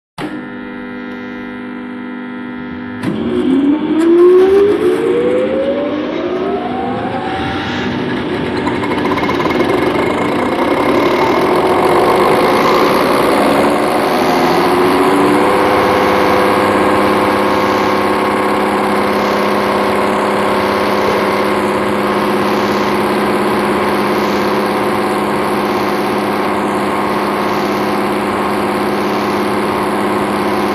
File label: Fuel Injector Pump